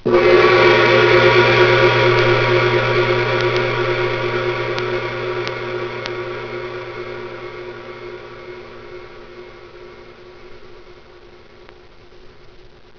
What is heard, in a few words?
gong